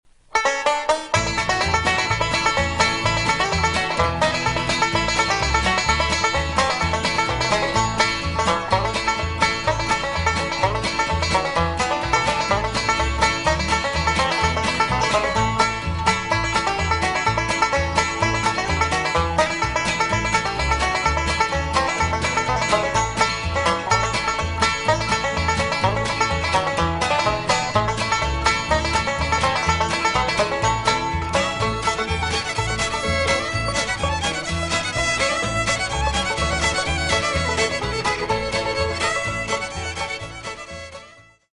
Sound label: Banjo; Ole Joe Clark